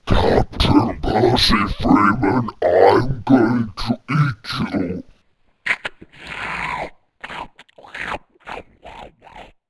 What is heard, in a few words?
Alert; valve; boogycross